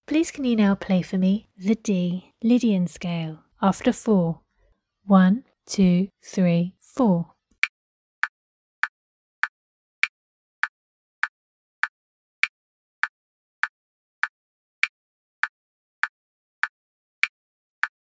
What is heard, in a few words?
d lydian scale